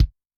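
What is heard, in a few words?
bass drum dry, Kick, Yamaha DTXpress-II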